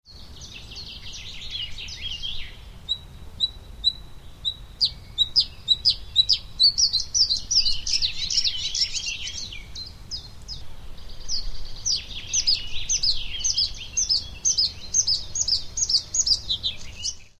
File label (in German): blaukehlchen; Weisssterniges Blaukehlchen; Luscinia svecica cyanecula